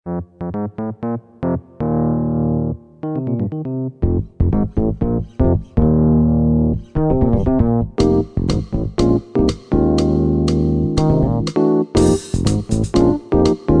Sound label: fusion jazz